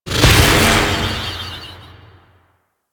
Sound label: Ball Charge Up; Ambience; Orokin Moon; Warframe